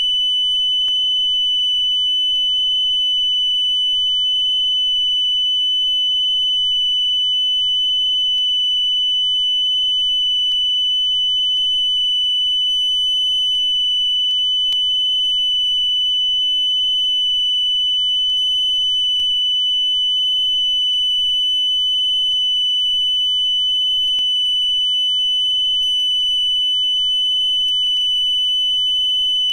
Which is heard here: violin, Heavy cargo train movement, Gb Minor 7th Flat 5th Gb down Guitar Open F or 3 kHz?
3 kHz